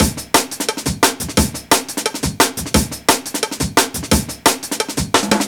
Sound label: classic dnb breaks, A, 175